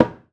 Kufi; Multisamples; Digital Sound Factory Planet Earth

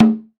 Alt Drum; Multisamples; Digital Sound Factory Planet Earth

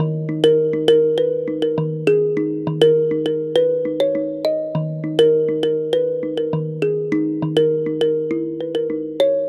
Mbira; Ambient; Melodic; Ambient Atmospheres & Rhythms

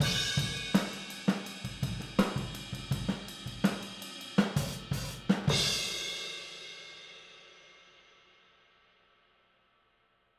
Boogaloo - Outro - Overhead, 82 BPM; Sonic Foundry; Drums from the Big Room The Mixes

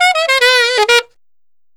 Alto, Jazz, Traditional, Alto Sax, Alto Loops; Sonic Foundry; Jazz Solos and Sections

